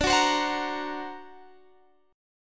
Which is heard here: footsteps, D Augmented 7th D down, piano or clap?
D Augmented 7th D down